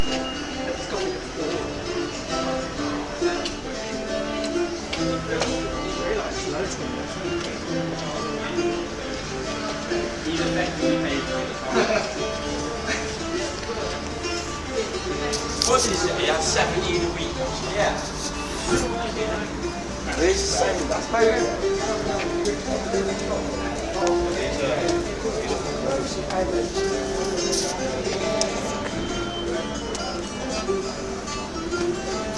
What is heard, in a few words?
mandolin guy in the sun